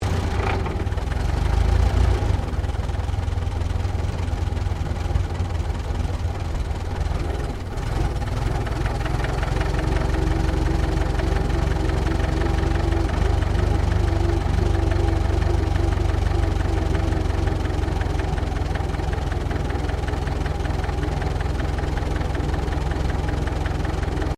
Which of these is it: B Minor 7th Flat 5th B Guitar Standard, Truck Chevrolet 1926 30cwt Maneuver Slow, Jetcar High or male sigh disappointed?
Truck Chevrolet 1926 30cwt Maneuver Slow